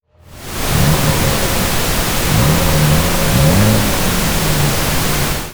Rev unattenuated

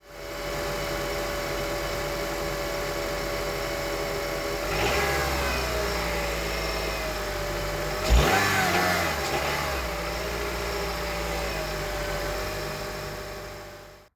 CRV revving

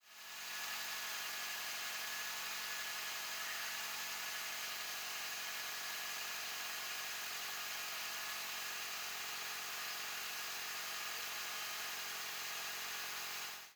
steady state

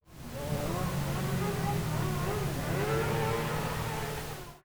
High Rev

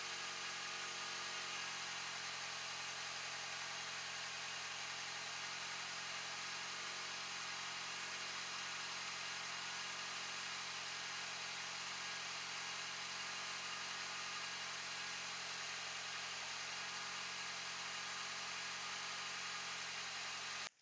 steady state a little higher idle filtered 1500

